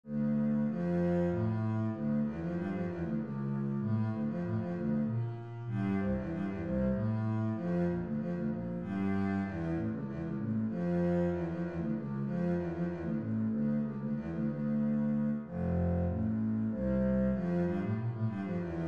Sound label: rondeau bass, string bass